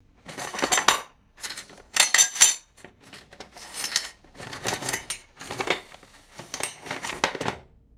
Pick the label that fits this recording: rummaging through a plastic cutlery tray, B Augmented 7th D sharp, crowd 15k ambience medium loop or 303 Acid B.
rummaging through a plastic cutlery tray